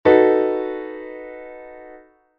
re#-fa#-la-si; Que cifrado americano ten?

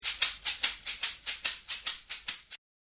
ganza pequeno

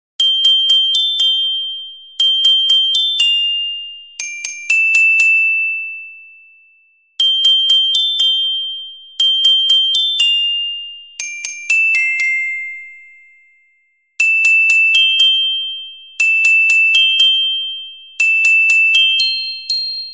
Carillon Contralto

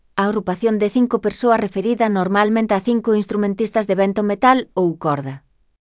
Quinteto

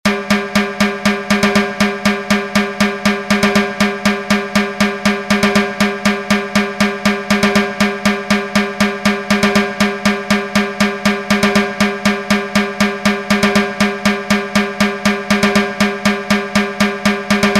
Tambor

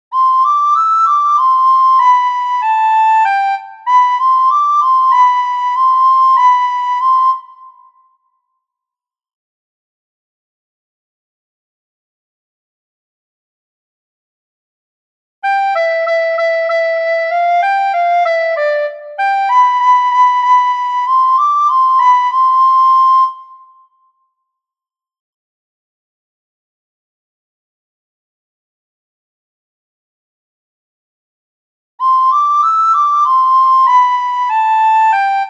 Frauta Doce; Non chas quero

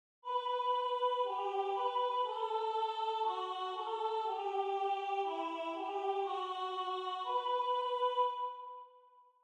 La Tarara retrouso 4 voces voz 3

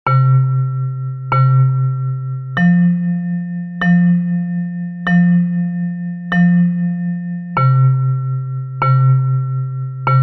Xota Metalofono Baixo

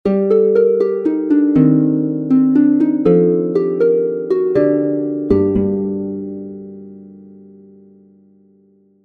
arpa

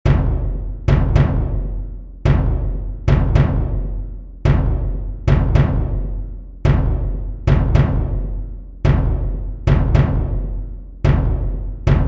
RUMBA Bombo

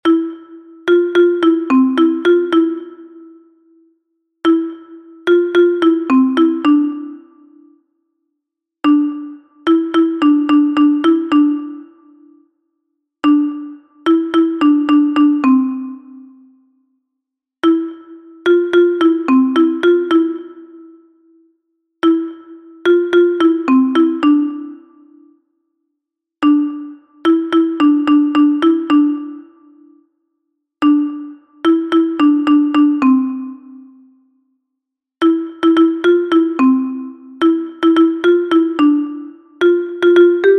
RUMBA Xilofono Contralto

